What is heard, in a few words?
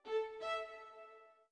orbit violin; Sky Children of the Light gamerip